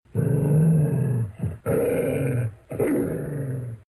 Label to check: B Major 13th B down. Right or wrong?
Wrong